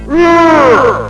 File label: waaaaaah; baddudes; yells like a fucking moron